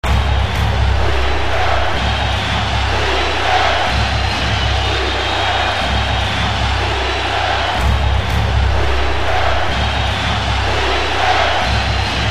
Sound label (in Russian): skandirovanie bolelschikov na futbole